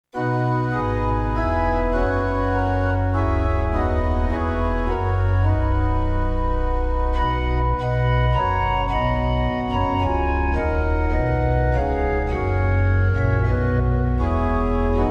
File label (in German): GED ROHR, organ